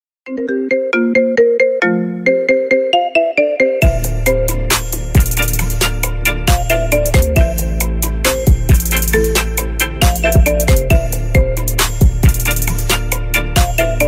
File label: marimba remix